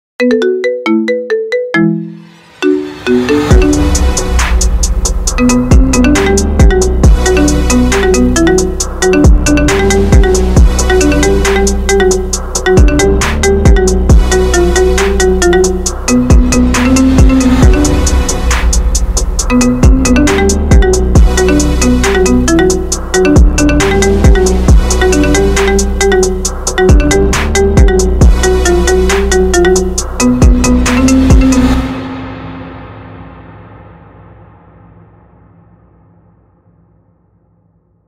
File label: marimba ringtone